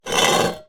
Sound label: Drag, Vase